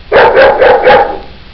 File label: dog long